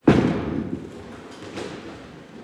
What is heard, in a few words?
Bowling Running; Interactive games; Grammar Games; Conjunctions